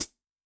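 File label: Pedal, HiHat; K13; Yamaha DTXpress-II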